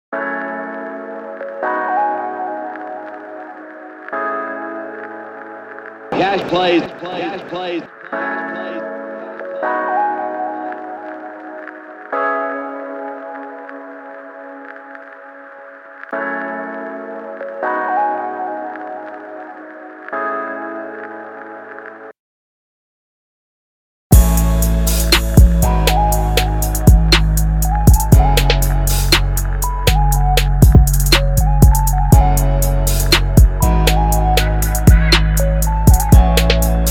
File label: Instrumental